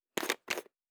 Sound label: Pills; Survival Game Sounds - Lite Edition; Objects and Items